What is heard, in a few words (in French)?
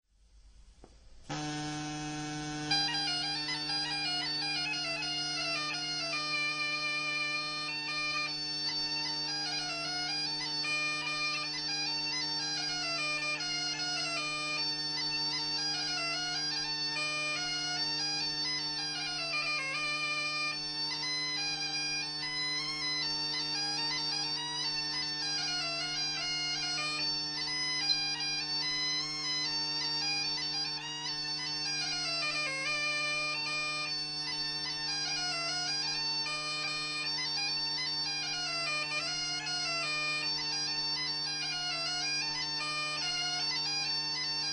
cornemuse